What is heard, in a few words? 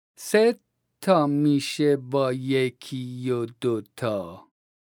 vocalization, Daf